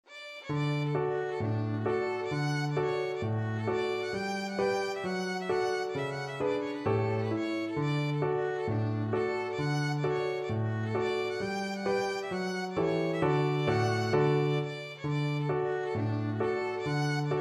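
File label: hornpipe, violin; alexanders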